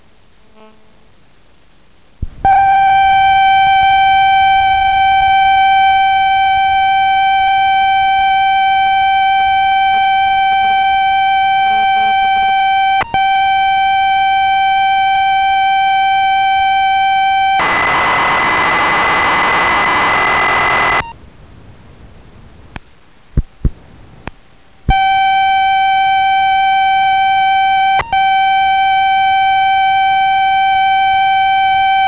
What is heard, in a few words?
Ball bounce